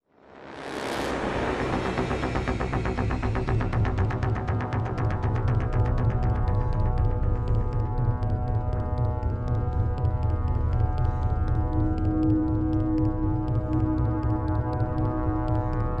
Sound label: MATRIX OF LEADERSHIP DRONE, soundtrack